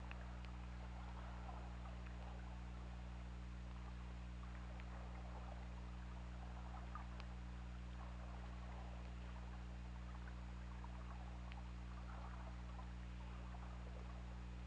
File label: Orca; OrcaLab